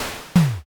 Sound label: garbage